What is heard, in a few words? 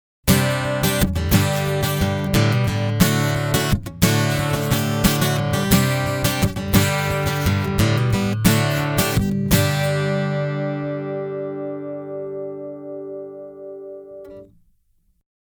Boss Resonance EQ Comp